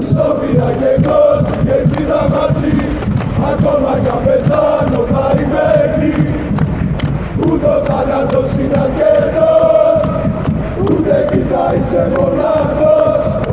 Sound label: chant